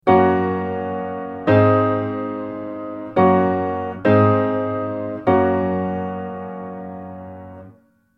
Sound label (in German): akkordfolgen